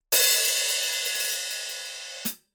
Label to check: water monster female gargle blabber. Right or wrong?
Wrong